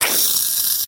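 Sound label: spray sounds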